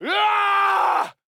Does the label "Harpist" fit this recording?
No